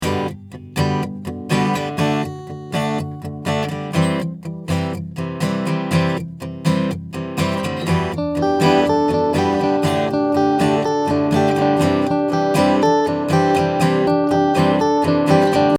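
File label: acoustic guitar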